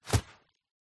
Clothing Fight Whoosh Material Foley Nylon Rain jacket; PMSFX